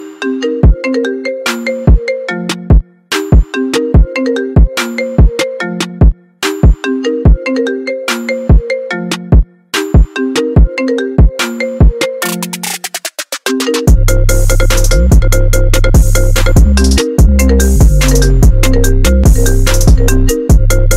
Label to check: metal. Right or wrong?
Wrong